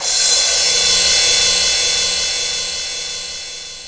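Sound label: REVERSE CYMBAL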